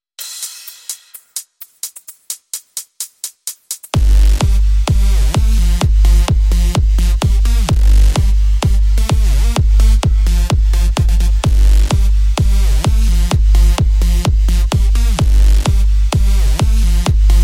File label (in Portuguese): toque EDM